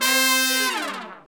BRS R&R Horns Falls; Roland L-CD702

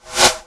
Swoosh, Drum Machines

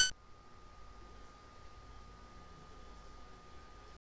Beep; Genesis

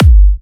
G, kicks; markus hakala kicks

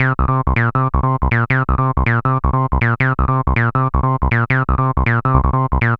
Hardcore Loops Acid Loops, Dance Synths, 160; Best Service ProSamples vol.37

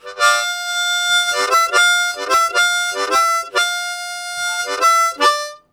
Reaching, Harmonica, Riffs; Zero G

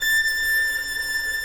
FIDDLE NOTES, A6, R

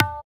tabla; Spectrasonics; Metamorphosis; INDIATEK

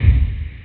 Gated K Verb; Proteus 2000; Digital Sound Factory Proteus 2000

